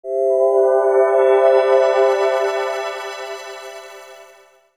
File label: Digi Pads; Jungle Frenzy 1